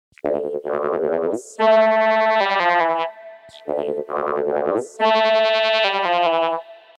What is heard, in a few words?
micro freak tremolo saw